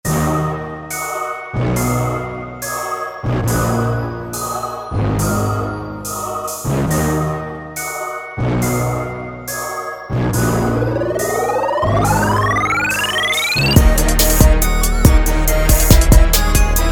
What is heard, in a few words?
Classic trap